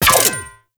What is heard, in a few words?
Fire Mech C, Lever Action Rifle; Warframe; Tenno; Sybaris Prime